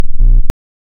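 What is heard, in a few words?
waveform FM triangle triangle silence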